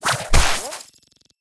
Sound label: Spawn Colorbomb; Pregamebooster